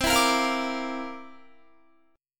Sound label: C 11th C down Guitar; C11 strummed